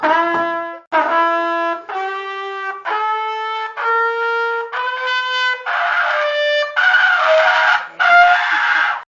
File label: Playing A Trumpet Really Badly; Old Sport